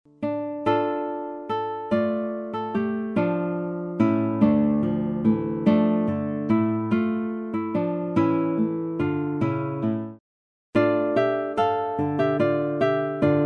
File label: Siciliano for guitar